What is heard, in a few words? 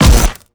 baton hit